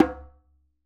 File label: djembe Single Hit Left Hand Slap; Raw Perc One Shots; Slate; SD SEISMIC Drum Sample Pack